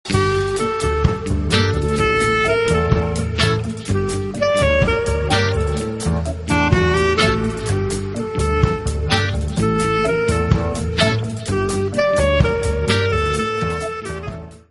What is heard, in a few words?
Clarinet